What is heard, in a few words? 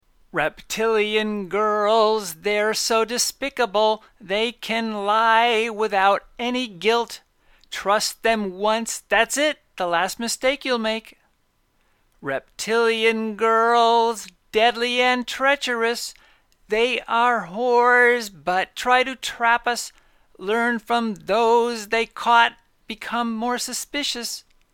Reptilian girl chorus